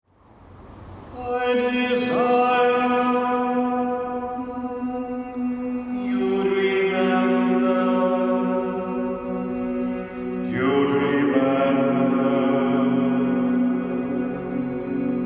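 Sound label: Requiem Kyrie Vocal Ensemble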